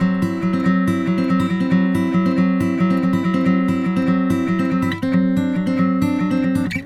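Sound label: Country Guitar (Chorus Sec 1); Digidesign; Pro Tools Essential Loops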